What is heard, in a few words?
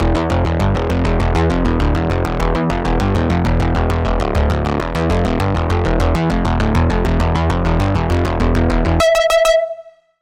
Bass; Routed Sound; Spire Expansion - Doom Carpenter Vol.1